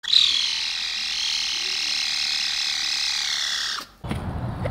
Caracal; سیاهگوش

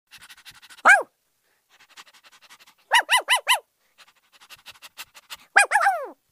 Barking cartoon dog; پارس سگ کارتونی

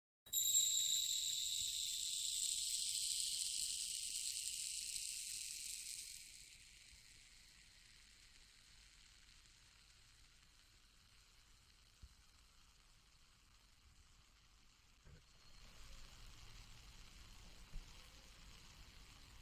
Hourglass

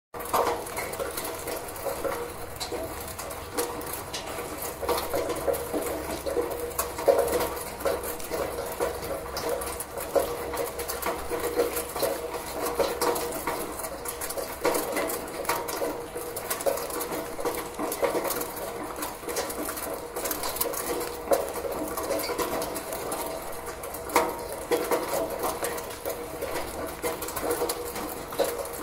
Rain on gutter

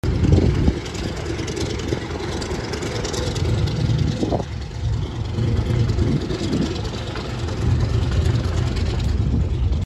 Douglas DC-3; هواپیما داگلاس دی سی تری

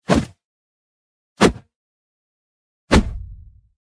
to push; هل دادن

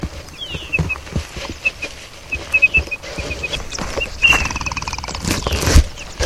Nothura minor

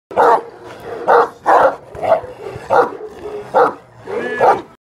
sarabi dog; پارس سگ; سگ سرآبی

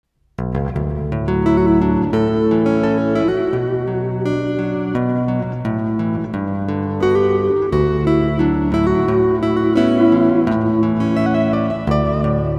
Chapman Stick

